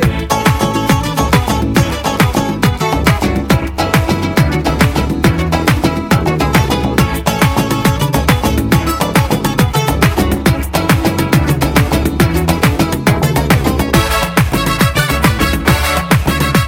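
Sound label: background music Telugu Ringtone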